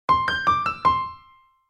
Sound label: win; mine sweeper epoch times